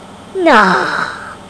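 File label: "nawww", exclamation; AUSRUF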